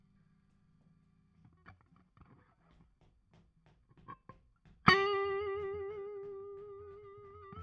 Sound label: Guit Solo Back